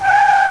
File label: skid